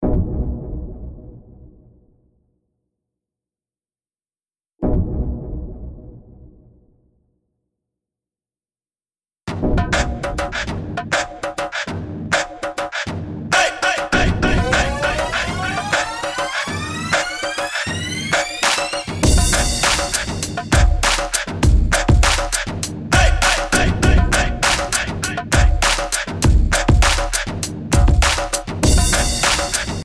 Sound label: Oriental Beat only bass; east asia